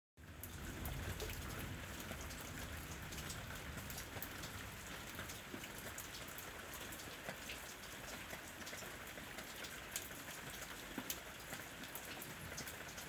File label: rain short